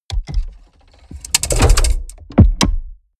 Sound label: mechanics large lock